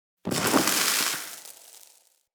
Spark Shower; Ambience; Venus Open World; Venus Solaris United; Fortuna

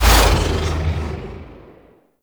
Dodge Right, Ship; RailJack Ship

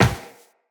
Run Metal, Footsteps; Tenno

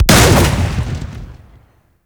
Gunblade Shot

